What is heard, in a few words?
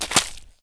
ice footstep; metrocraft